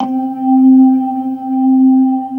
HAMMOND; Jazzistic; C4

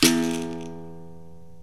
BERIMBAU; OMI - Universe of Sounds

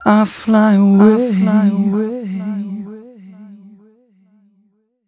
m 129 mel e, Vocals

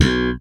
Spector pull, Bass

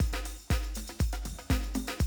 120, Classic Drum Loops

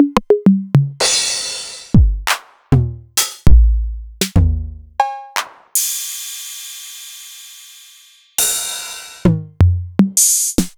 Novation Drumstation

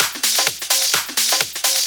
Discohouse Loop 128 BPM

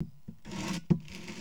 LITE BOX, Box Noises; E-MU Producer Series Vol. 3 – Hollywood Sound Effects; Ambient Sounds

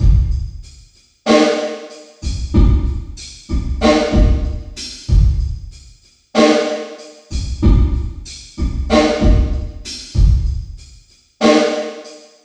94 REVERB, Dark Side Of TripHop; 94 REVERBS

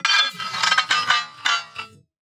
Perc Lo-fi Perc

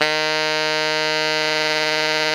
TENOR D#3, Saxophones; Greytsounds; Philip Wolfe David Crozier's Saxophones

